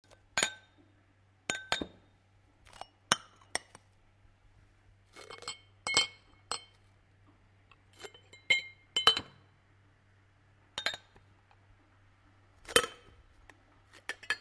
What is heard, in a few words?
water glasses